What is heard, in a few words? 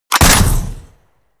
shot